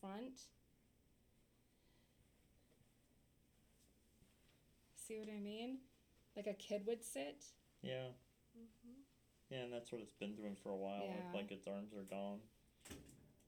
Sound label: Book Falling